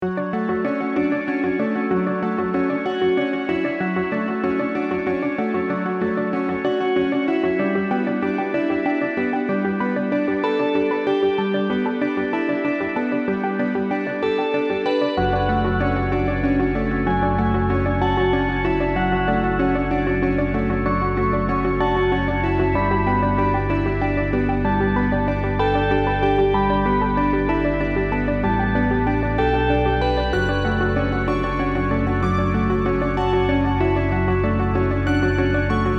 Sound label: Background Music